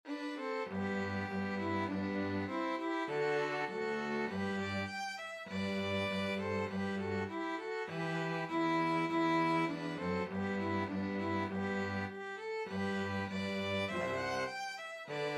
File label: christmas eve 2 violins cello